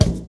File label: BD, Drums Dance & Groove; USB Soundscan vol.11; AKAI; NYORK KIT